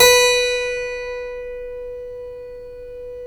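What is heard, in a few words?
B3 clavinet left, Keyboards Acoustic; USB Soundscan vol.17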